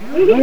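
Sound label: giggle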